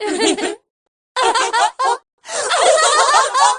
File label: crazy; p4u; aigis